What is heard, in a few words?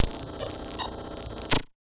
Casio, Glitched, malfunctioning synth